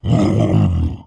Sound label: kagouti critical injury; skywind